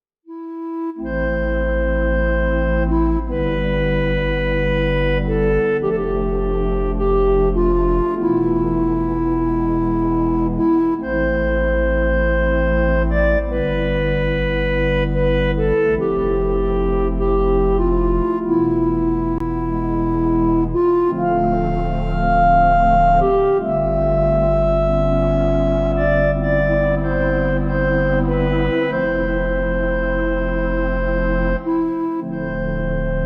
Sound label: Clarinet; VIVO S10